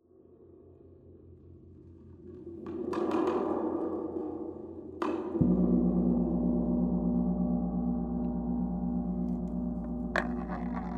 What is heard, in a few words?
Gong